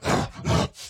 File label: Enraged Breathing Loop; Devour; The Town; devour 2021 gamerip pc macos